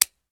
c4 cut

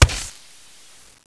slap knife hit

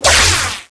razor start

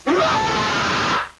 scream

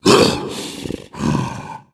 nemesis pain; cstrike; RA Zmsounds

